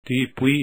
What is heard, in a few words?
yell